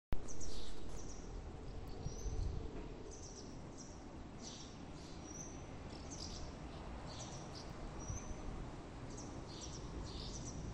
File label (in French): Mas Cloches, oiseaux; Dans le village du Mas d'Azil (en Ariège), un clocher sonne les heures à l'aide de quatre